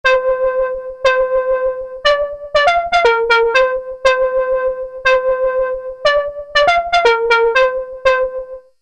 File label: MESSING FLUTE; mfb synthII